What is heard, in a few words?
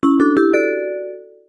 Level up; Pocket Academy 3